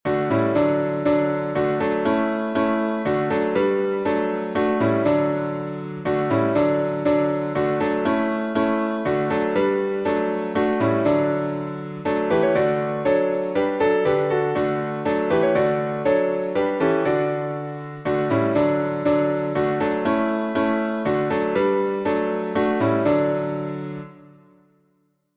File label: D Major, hymn; Come Thou Fount; Nettleton